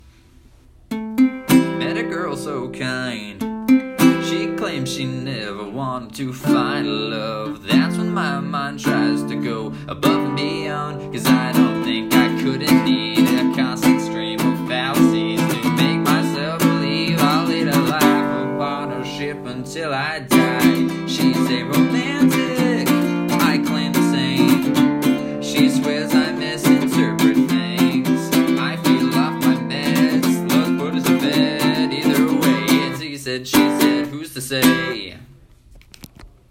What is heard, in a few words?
Romantic music